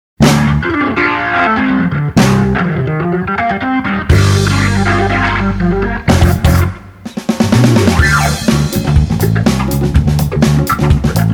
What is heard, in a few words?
organ